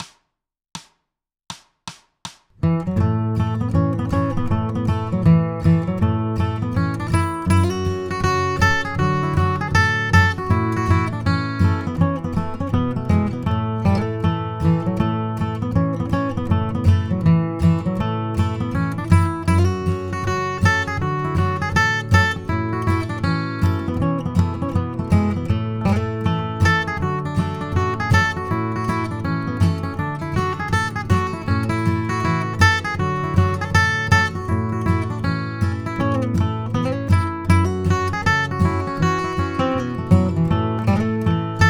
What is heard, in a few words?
guitar Cherokee Shuffle